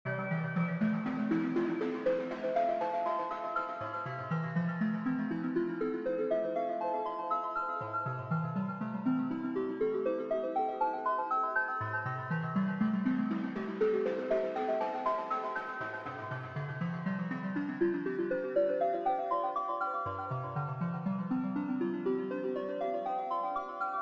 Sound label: Dreamy Bubbles, Loop